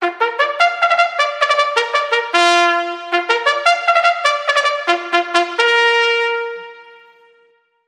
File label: Call To The Races; Trad (PD)